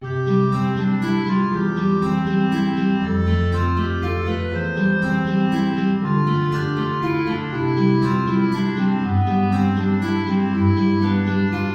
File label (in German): Menuet Klarinette in Bb Gitarre tief; BWV Anh 114